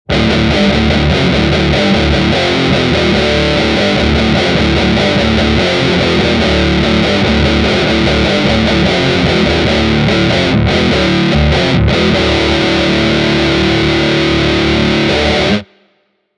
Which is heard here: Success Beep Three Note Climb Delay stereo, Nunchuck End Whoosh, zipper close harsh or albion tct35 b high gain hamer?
albion tct35 b high gain hamer